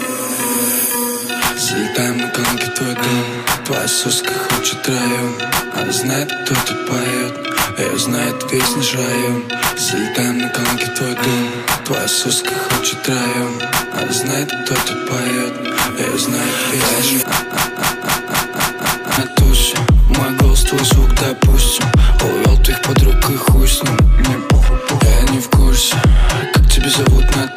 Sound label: russian trap